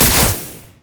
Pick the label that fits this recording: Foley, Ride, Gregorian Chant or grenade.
grenade